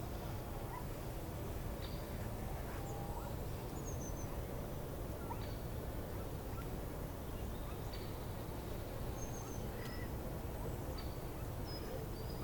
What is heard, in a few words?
Forest Park